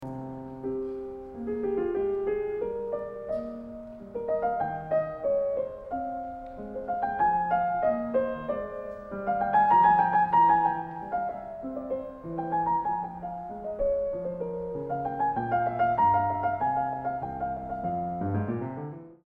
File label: Bach C minor Partita